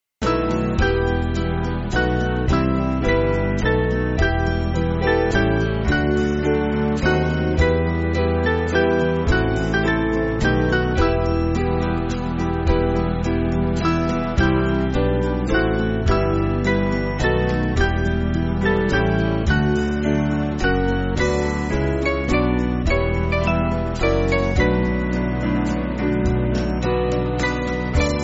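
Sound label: Band, Backing Files for Churches; Tydi A Roddaist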